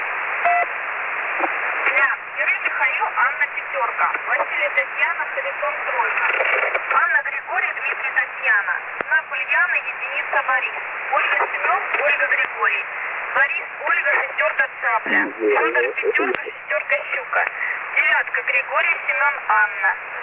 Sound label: usb msg